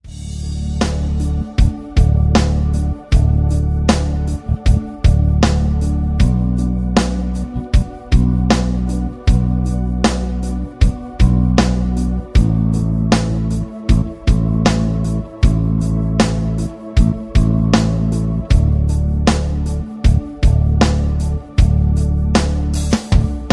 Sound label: rhythm